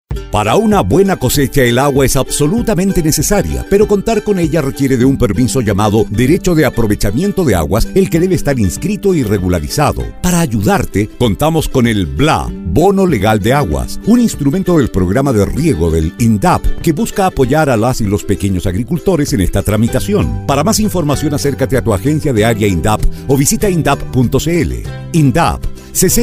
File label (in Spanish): jingle riego